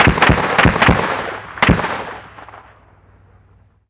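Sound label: gun 50cal medium; Call of Duty Modern Warfare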